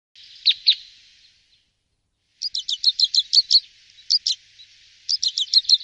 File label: twitterbird